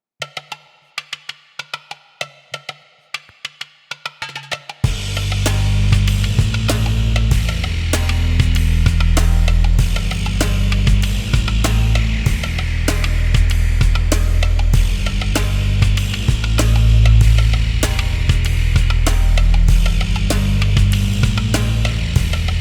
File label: music level